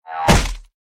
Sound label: player big feet half; Adventure Time - Break The Worm; ThrillGames